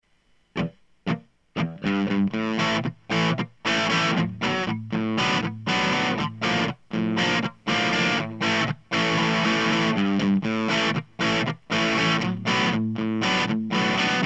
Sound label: dist Gtr, mic tests